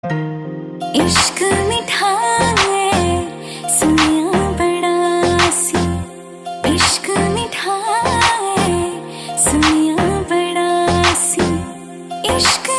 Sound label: Love Ringtone